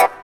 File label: SYN WAH, dance synth; Sound & Vision - Gigapack I CD 2 (Roland)